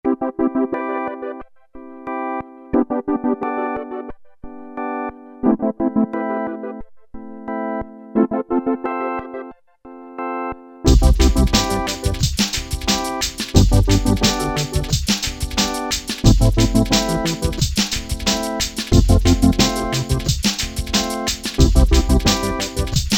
Liquid Dub

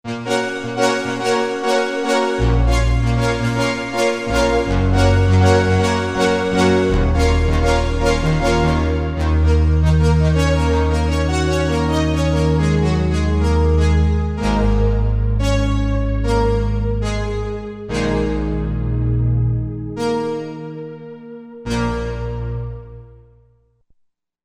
2 voices; Korg MSC-12S Synth 3

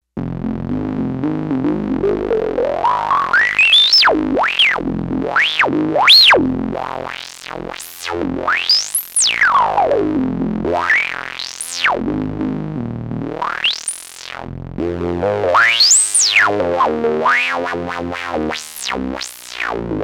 BP Seq, Arturia MicroBrute; Factory patch sheets

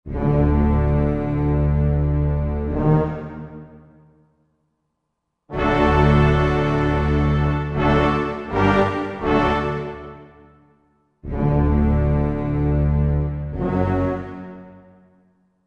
Full Orchest, Roland JV-2080

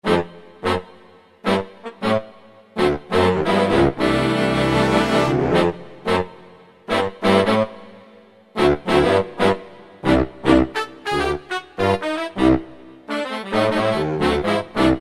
Stab Stack; Kurzweil K2000 V3

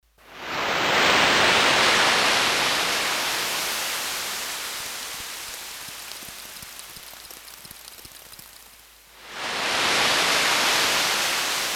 Seashore, Roland D-10; Factory Patches

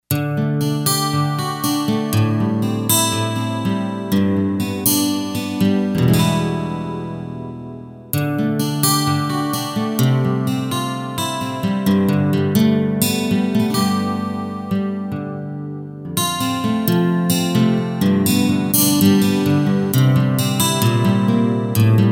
ENH. STEEL, Roland SC-880; Factory Patches

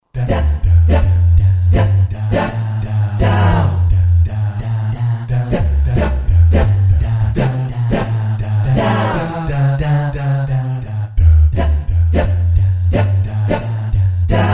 Jz. Dow Fall, Roland SR-JV80-13 Vocal Collection

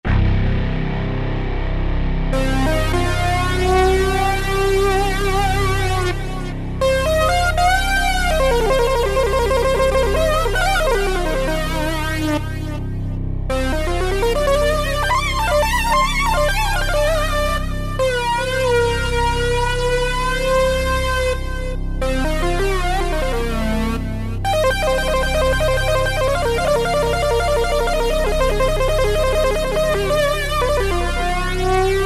Power Band, Korg N364; Factory Combi demos

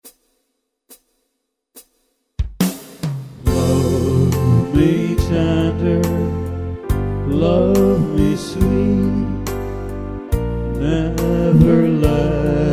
Country Men, Vocal Harmonies; Yamaha PSR-3000

